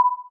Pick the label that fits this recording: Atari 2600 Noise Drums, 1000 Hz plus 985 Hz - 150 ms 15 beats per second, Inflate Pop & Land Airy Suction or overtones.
1000 Hz plus 985 Hz - 150 ms 15 beats per second